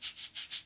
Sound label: 180 cabasa gentle, drum loops and breaks; 161 to 180 bpm